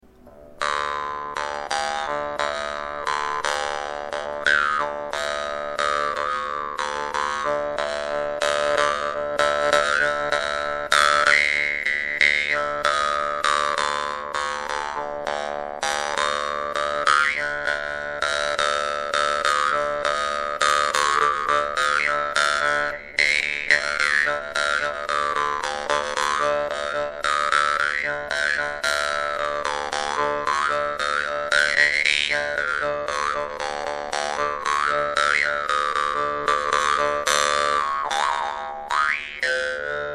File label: dan moi; rublen; dan moi tonki trostnik